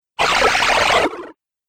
Wacky Water Video Game

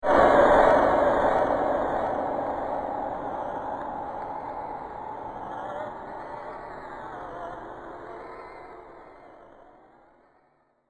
Haunted Impact With Horror Winds; Ghost Sound Effects

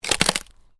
Ice: short crack of ice under pressure

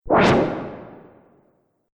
Multimedia Rollover, mouseover sound; Business Programs, Apps And Computing Noises

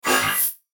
FLASH ELEMENT HIGH TECH; Multimedia; Blastwave

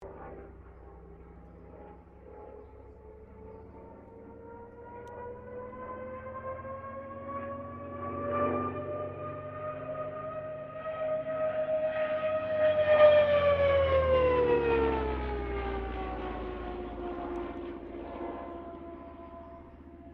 AIRCRAFT CA-13 BOOMERANG LOOP STEREO; Airplanes (Sound Effects:Vehicles)

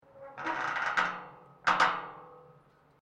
GATE METAL LARGE CREAK, Large metal gate; Gates (Sound Effects:Doors)

